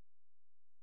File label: C#, Synclav FM, FX; Big Fish Audio